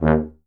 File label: D2 TUBA